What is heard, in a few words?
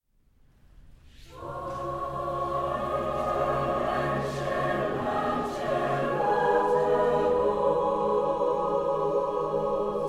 lullaby, concert choir, live; lullaby and good night; mit concert choir; der abend; world premiere